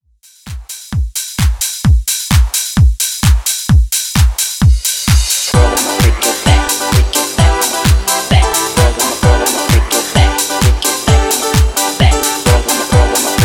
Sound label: Classic Remix 130Bpm